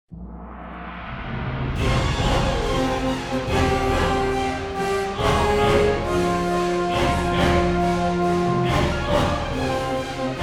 WIN; ArmedAndDangerous; Wrath

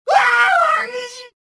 choke

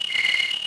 crickets

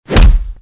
hit; Soldier Of Fortune II Double Helix; XBOX

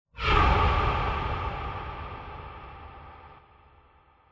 Slow Motion on; music; The Da Vinci Code; XBOX

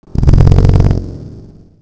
pipe; The Elder Scrolls III Morrowind GOTY; XBOX

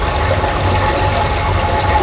water rushing in pipe

